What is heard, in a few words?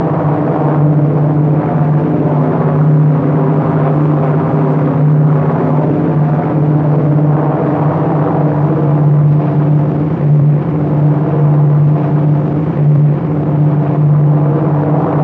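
vehicle ac130 exterior medium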